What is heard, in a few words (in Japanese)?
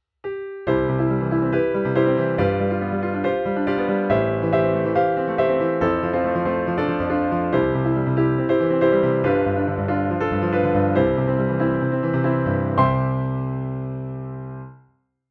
奏法例 BPM140, piano